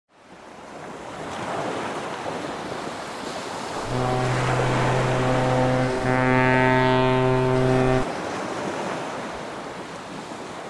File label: ferry, sounds of mumbai